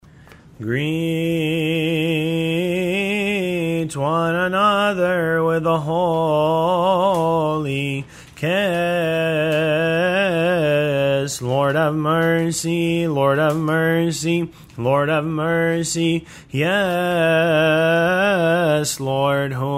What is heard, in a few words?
greet one another gregorian